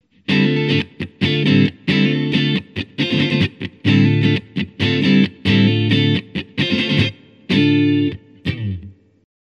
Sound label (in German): EQ High Cut Strat Hals PU Yamaha